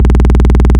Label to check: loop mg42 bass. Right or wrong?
Right